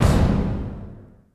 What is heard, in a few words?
movie, impacts & hits; Techno Trance Essentials